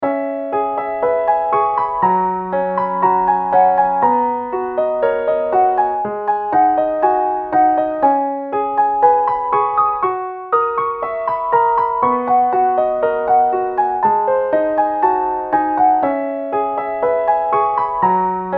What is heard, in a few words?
piano